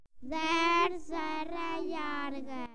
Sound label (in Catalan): Serra Llarga; El nostre alfabet; abecedari de la localitat